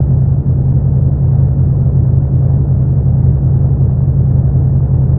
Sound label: Engine Corner, ambience spaceship